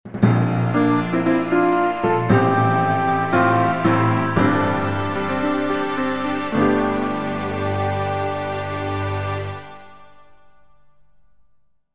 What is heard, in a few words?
Fanfare; Super Trench Attack